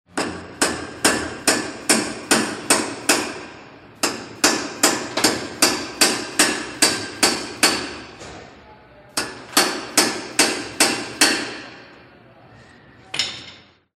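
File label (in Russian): звук молотка на строительстве дома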